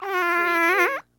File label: Fart Squeak